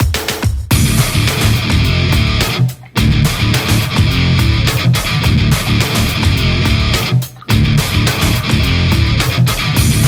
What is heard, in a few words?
heavy noise; action debate; unib